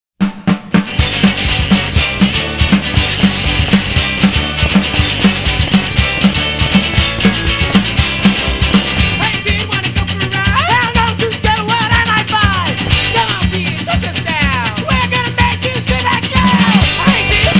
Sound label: Galatic Electro